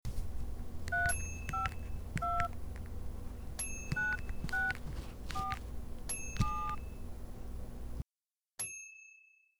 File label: Dialing a Cell Phone